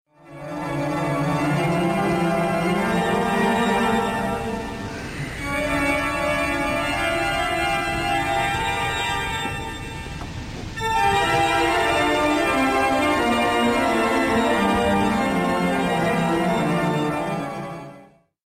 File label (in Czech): varhany